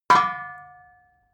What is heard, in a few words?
metal impact bucket solid; objekte; eimer